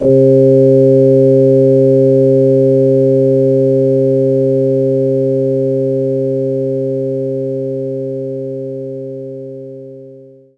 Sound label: Hammond Organ